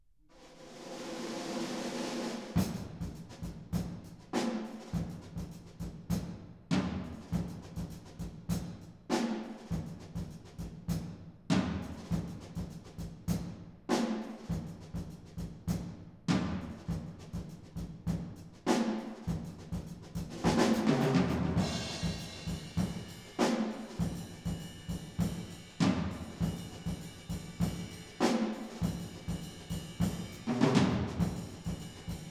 Percussion Imaging Tests, Drum 70 Feet, Live Studio